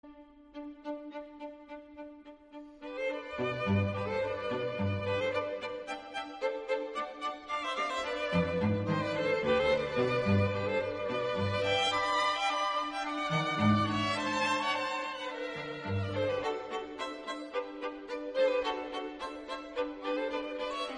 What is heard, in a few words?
String Quartets; SHOSTAKOVICH, D.; Nos. 6, 8, 11; Classical Music